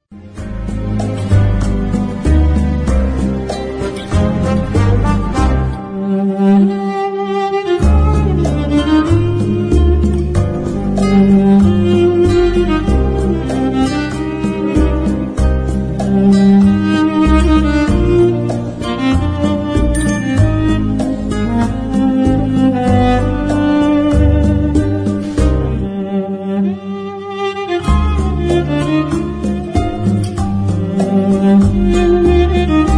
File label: Cello